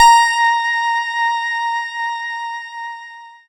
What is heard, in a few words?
PLUCKED, Synclav FM, A#; Big Fish Audio